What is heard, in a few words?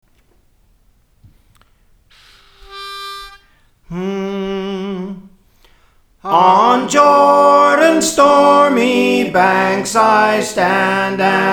Soprano, Praise For The Lord Training; On Jordans Stormy Banks (OKane)